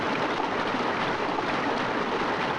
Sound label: water